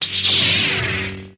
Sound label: Notification